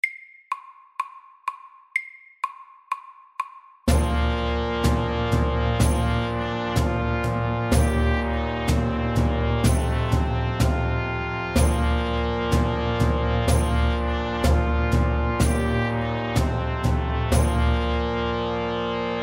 engelsk melodi FAST

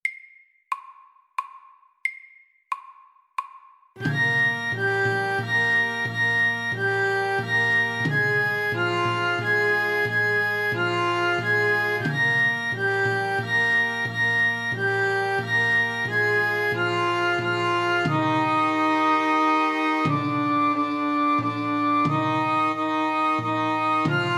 Irsk melodi SLOW

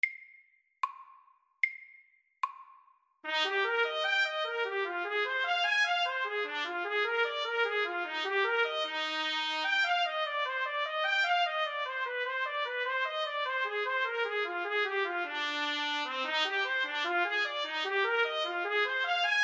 Arpeggio etude FORT

